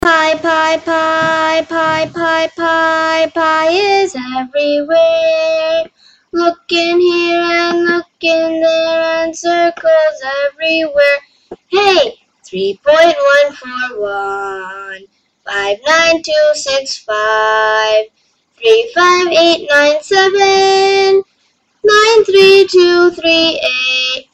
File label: Jingle Pi